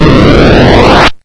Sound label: boost; MapleShrine; mkxp; gameasync